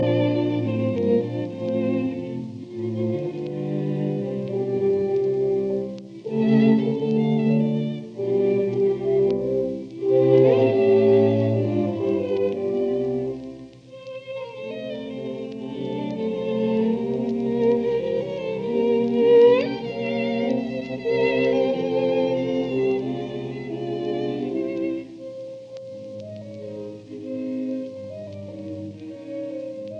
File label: Octet in F major D 803 V Menuetto Allegretto; Schubert